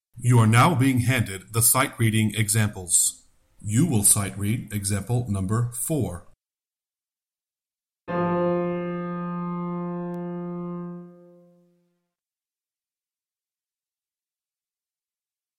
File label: Sightreading Example Tenor